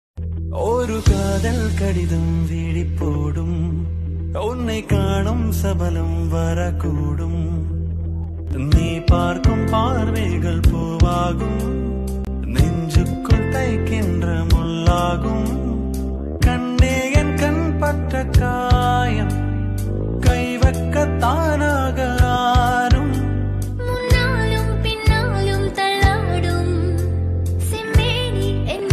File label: tamil ringtone